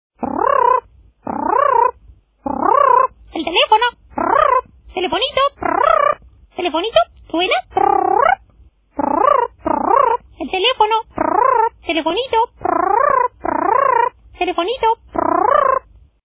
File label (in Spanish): loro el telefono